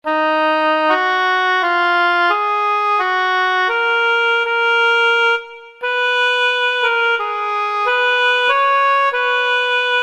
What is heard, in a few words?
2nd Oboe; Kurzweil K2000 Orchestral ROM